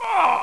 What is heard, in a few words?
slay male; Exile III v1.0